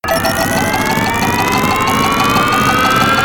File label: gain up, progressive jackpot; wbg games